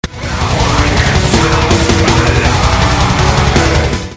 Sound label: Waltz